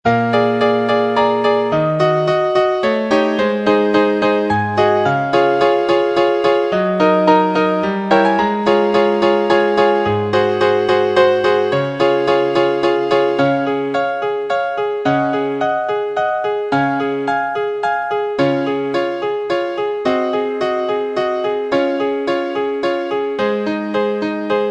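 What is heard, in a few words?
accompaniments, Trumpet in Db; Vocalise #4; concone; Rudimentary Transposition Studies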